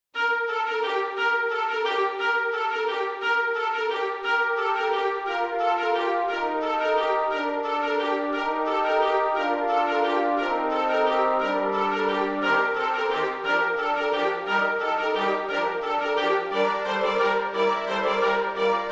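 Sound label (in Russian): уютная музыка без слов для нового года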